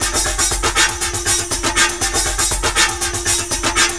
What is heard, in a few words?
Windy Beat, Electronic Beats; Sonic Foundry (Sony Creative Software); Myths of Technology